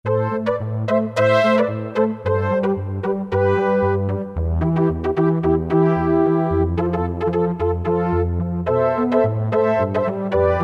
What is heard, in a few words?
Syn Brass 3, Roland MT-32